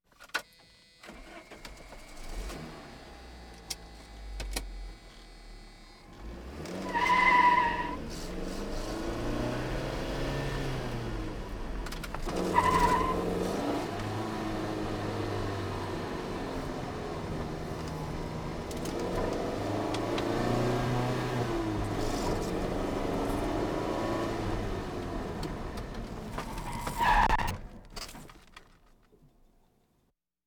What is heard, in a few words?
Automobiles various